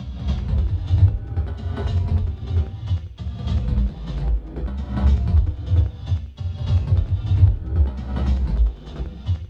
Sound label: weird bass; Zero G; Malice In Wonderland